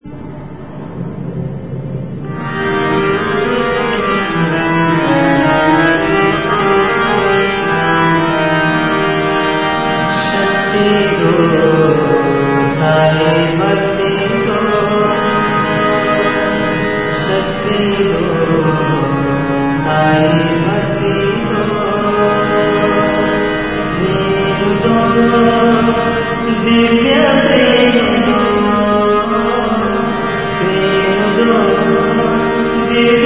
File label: Bhajan; Bhajan Bliss